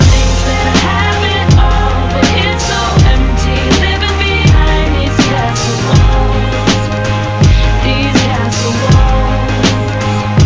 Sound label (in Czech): hudba; cstrike